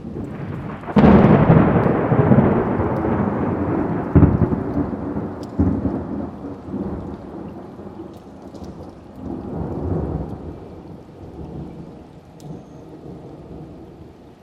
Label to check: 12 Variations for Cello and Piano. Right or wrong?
Wrong